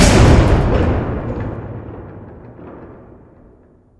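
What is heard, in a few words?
hall elevator stop; hl2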